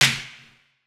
Snare, Dubstep Snares; GhostHack

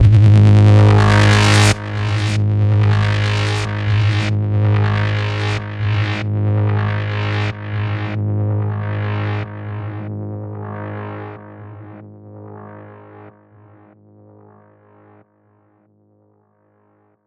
Morphoscanner Zap G# 140 BPM, Psytrance; Zaps & Filter Sweeps; Ghosthack; Psytrance Visions Volume 2

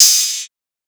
Ride, Techno Drum One-Shots; Ghosthack - Free Techno Drum Shots 2024

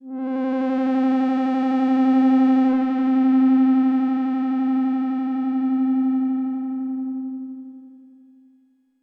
PAD Layer (C); Melodic One Shots; Cymatics; GHOST (Beta Pack)

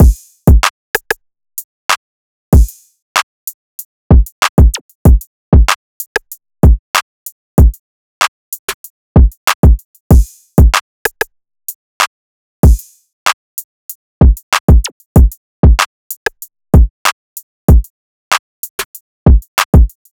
Drum Loop - 95 BPM; Double Up; Cymatics - Infinity Beta Pack 2.0; Infinity Drum Collection

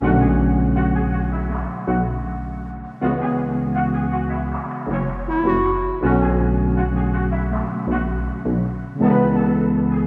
160 BPM F# Min; Cymatics; Zodiac III Beta Pack; Zodiac III Production Suite

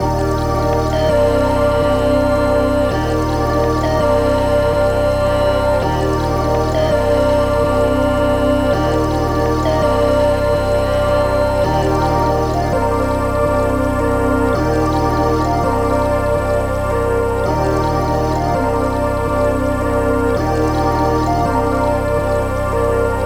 165 BPM G Min; Painless; Wavy

